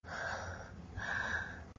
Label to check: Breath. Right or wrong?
Right